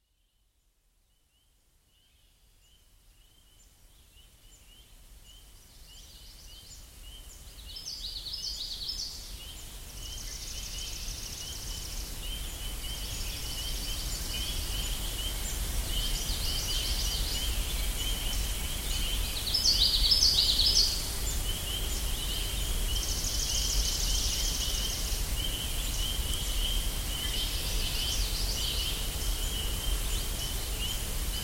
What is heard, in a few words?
healing sounds of birds; deep energy 269